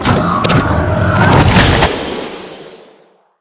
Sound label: combat drone launch; ships